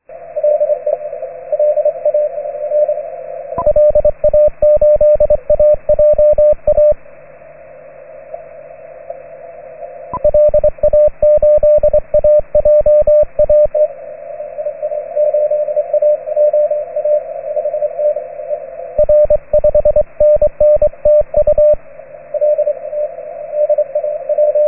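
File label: 40m Morse code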